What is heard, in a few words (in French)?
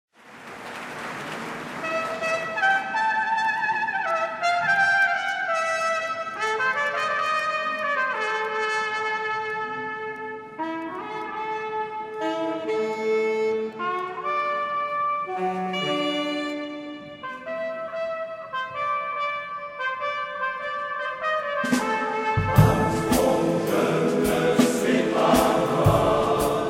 musique